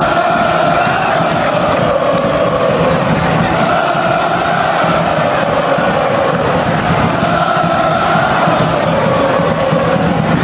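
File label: goal chant, football fan chants; melbourne victory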